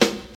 hip hop steel snare drum a sharp key, acoustic snare sound tuned to the A# note